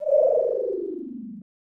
owl; Madara 2

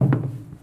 footsteps metal walk; Poppy Playtime

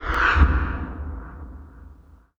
effect change

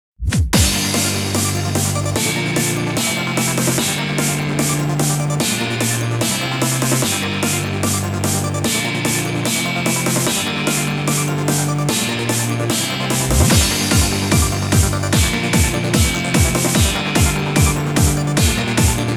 Hands Up Remix; 96024 Wheel Game; Disco Train; Super Donkey Kong 2

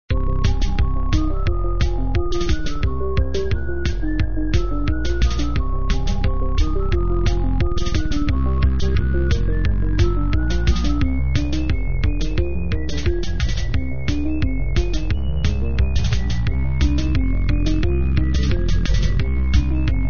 Loop Fast; Trick or Treat Smash Online; Windows

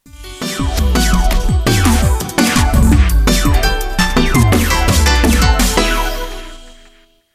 Victory; Fate Extella The Umbral Star

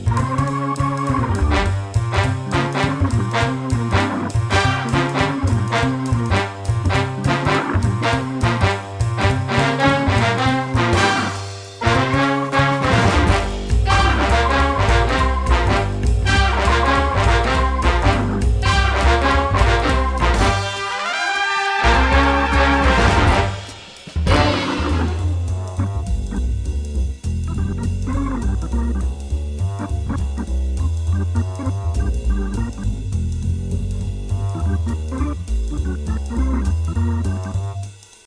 Retro; American McGee Presents Bad Day LA

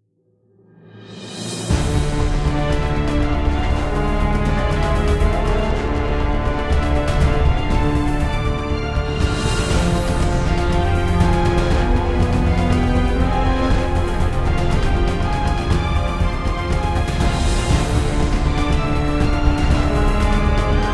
Combat; Mass Effect 2; Container Transfer Area

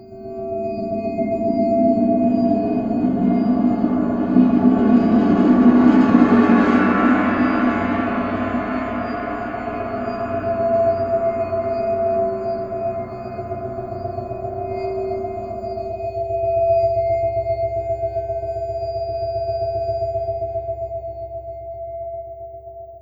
Ambient Layer

